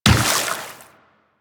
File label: Run Water, Footsteps; Warframe; Tenno